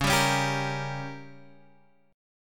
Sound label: Bb Minor 6th, guitar